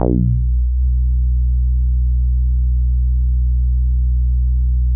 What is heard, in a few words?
Synths BASS